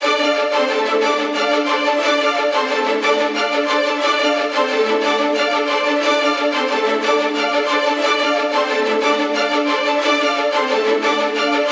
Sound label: Viola Ostinato Am 120Bpm; Construction Kits; STEMS; Ghosthack; SkyRealm; Kit 21 - Mystical Journey